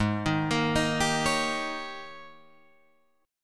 G sharp Major 7th Suspended 2nd Suspended 4th Guitar Drop D